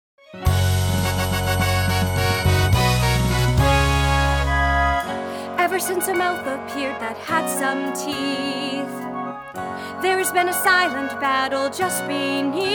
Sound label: vocal